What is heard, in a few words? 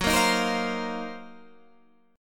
Eb Minor 6th Add 9th Gb, guitar chord